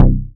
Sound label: Bass